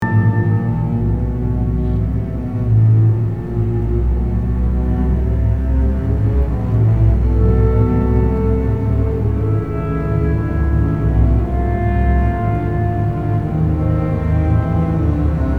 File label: Rising Chromatic Motif Bass and Cellos; 1st movement; symphony1